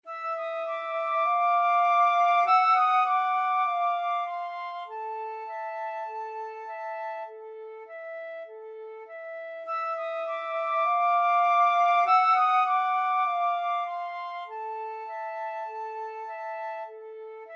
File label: flute duet